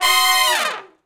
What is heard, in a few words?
Med Length Falloff (Ab), horn sections; Crimson Blue and Fabulous Horncraft 4 RnB